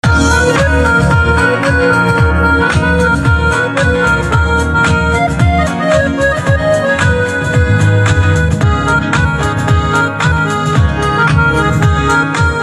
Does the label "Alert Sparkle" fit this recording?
No